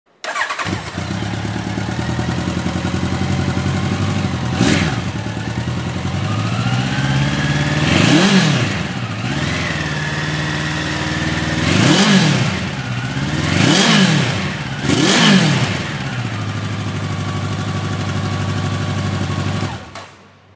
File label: Honda CB1100